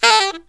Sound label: SOLOSAX, Killer Brass Riffs; USB Soundscan vol.29 - Killer Brass Riffs; AKAI